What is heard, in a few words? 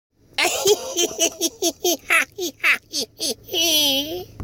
Creepy Laugh